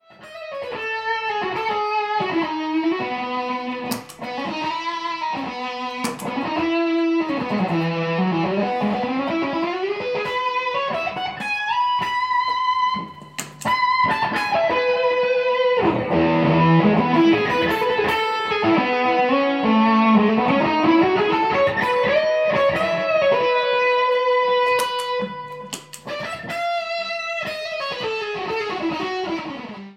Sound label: PREAMP and DELAY